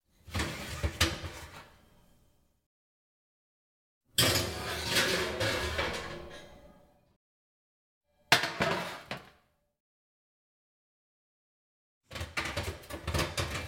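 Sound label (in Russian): сковородку ставят на противень и достают из духовки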